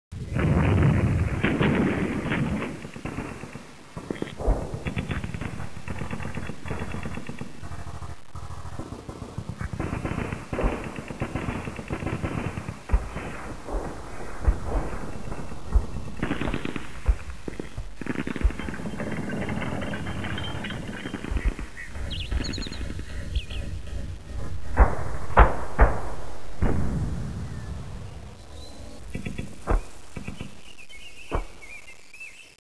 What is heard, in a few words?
field battle